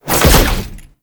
Dodge Land; Enemies; Abilities; Warframe; Tau; Dax Ninja

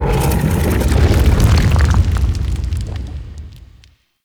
golden maw burrow enter; Gameplay; Warframe; Ambience; The War Within

